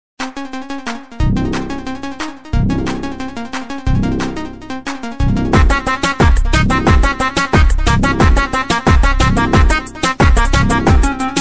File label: ultimate arabic bass